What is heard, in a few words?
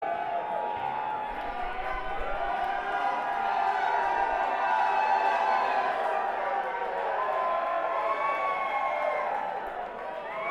Banter Crowd